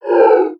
Remove Blocker; moul

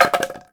Log Stn Hit

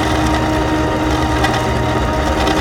Elevator Gear loop

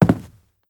Soft Wood Walk; moul-assets

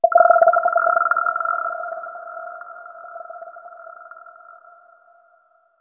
Forest Amb; moul

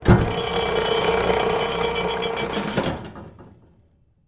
Slot Device Away Return; moul-assets

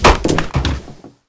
Fumerol Cap Blow

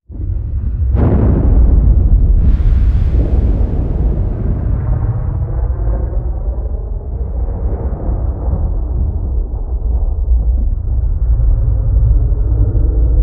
Explosion

